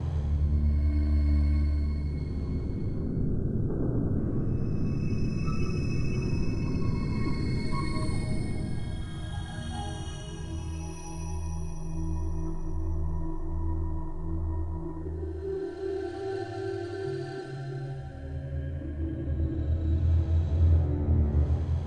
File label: spooky, loop